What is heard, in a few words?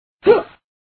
jump; groof runner